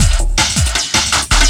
LOOP, 160 BPM, Drum & Bass

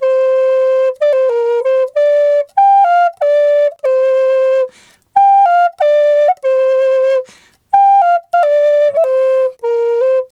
G2 93, Didgeridoo; Big Fish Audio - Didgeridoo and Other Primitive Instruments

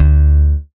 E bass (D); Sonic Foundry (Sony Creative Software); Ugly Remnants VOL-2; Basstuff

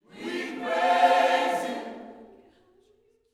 WE PRAISE, Gospel; Spectrasonics; GOSP PHR

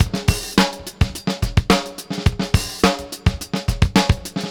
Tronix 106; Zero-G; Monster Beats

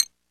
KEY, Organs; Club-50 - Foundations Roland

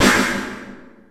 KOMMERZDRUMS; Soundengine - CSR-000 The Art of Transwaves

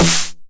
909 SD Tape Sat

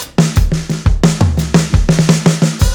87 FILL, drums; LOOPS; Basement drums vol 2

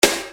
Snare Dr

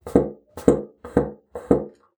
BASSOON; Xsample VOL-6

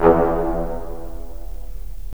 BRS, Roland LA Composer

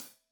Hat Loose, Hi-Hat, Cymbals Stereo

